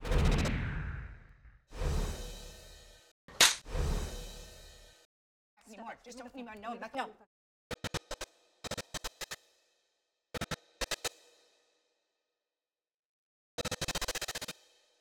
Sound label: SFX; Definitely Divorcing